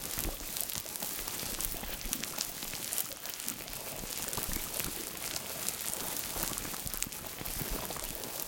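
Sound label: timelapse loop; chicory a colorful tale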